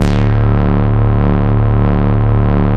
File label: UGLY BASS, Bass Analog & Synth, techno; USB Soundscan vol.12